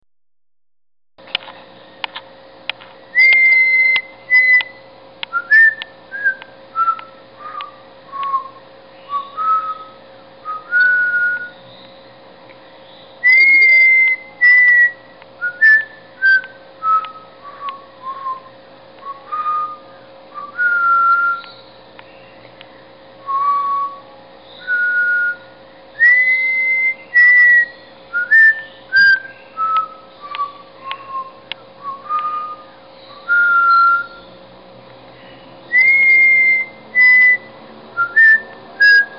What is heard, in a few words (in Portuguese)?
Canto de Calopsita